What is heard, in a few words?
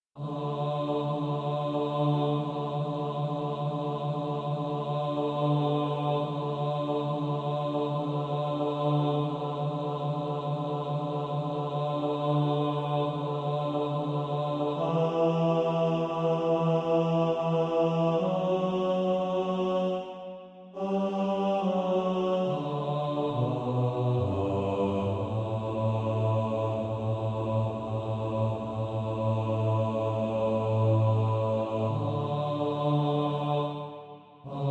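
bass, practice music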